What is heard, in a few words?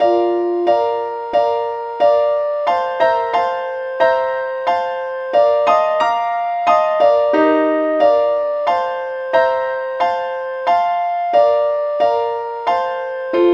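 lead sheet, key B flat; SAINTTHOMAS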